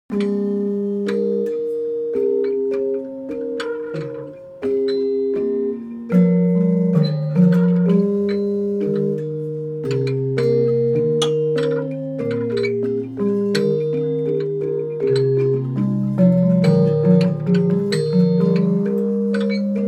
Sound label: pangkur example gendér barung